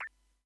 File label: cannon; yehat